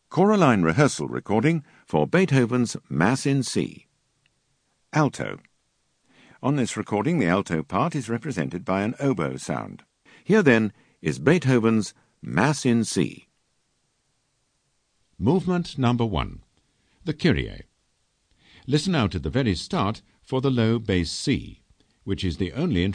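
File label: Mass C Alto; Beethoven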